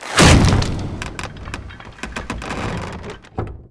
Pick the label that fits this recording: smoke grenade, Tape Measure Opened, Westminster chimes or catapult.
catapult